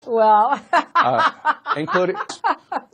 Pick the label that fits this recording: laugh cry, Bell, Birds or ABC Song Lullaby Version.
laugh cry